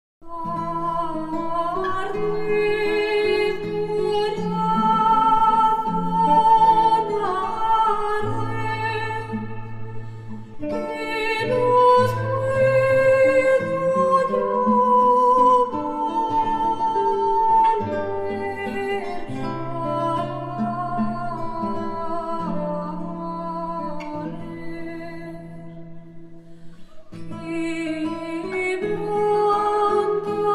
Guitar; Arded corazon arded; L de Narvaez

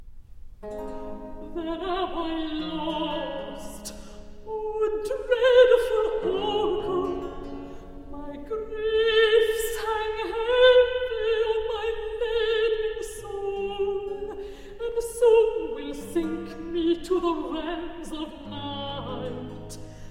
Recitative; Own the Pow'r of Harmony!; Then am I lost; Hercules HWV 60 1745; GF Handel

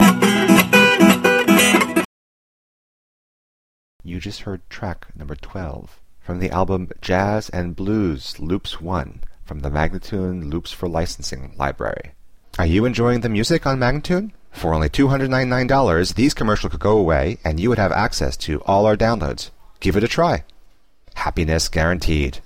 Jazz and Blues Loops; MagnaLoops

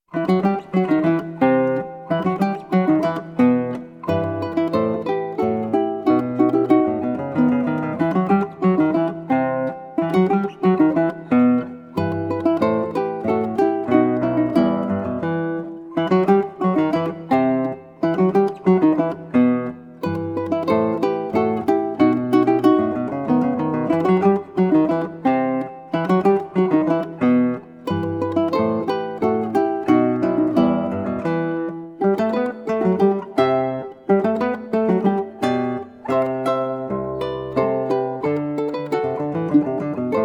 Polonaise, Guitar and Ukulele; BWV Anhang 125; JS Bach; Johann Sebastian Bach

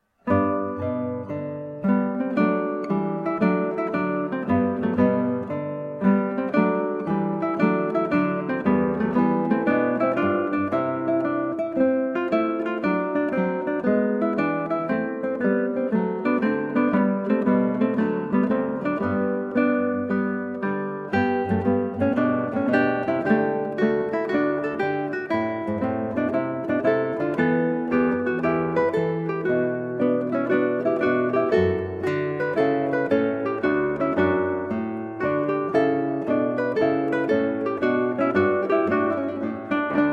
Andante from Trio Sonata, Guitar; TWV 42 E8; Georg Philip Telemann

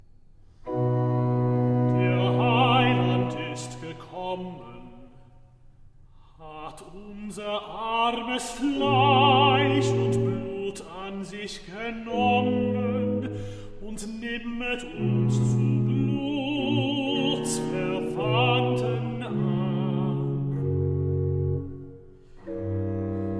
Recitativo Cantata; Nun komm der Heiden Heiland BWV 61; Johann Sebastian Bach

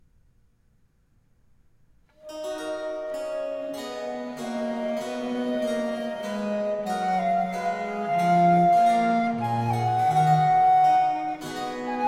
Sonata in E minor for flute and continuo Adagio; Handel; Hallensersonata No 2

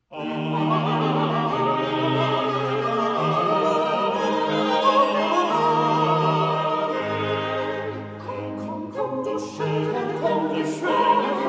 Chorale; Nun komm der Heiden Heiland BWV 61; Amen Amen; Bach; Cantatas

